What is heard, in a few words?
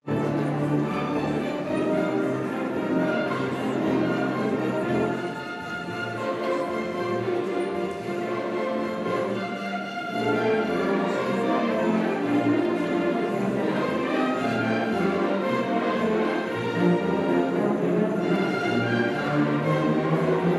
instruments